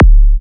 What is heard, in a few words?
Minimal Kick, Drums, Kicks